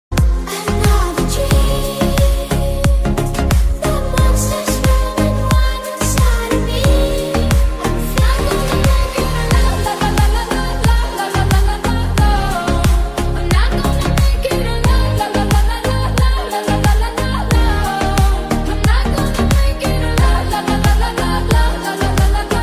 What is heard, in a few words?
english bgm